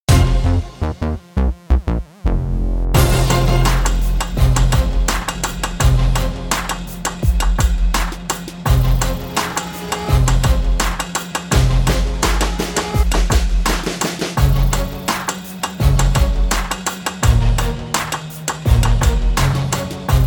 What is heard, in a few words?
Hip Hop; Pixel Gun 3D; Humpty Dumpty Set; Hip Hop Hero 4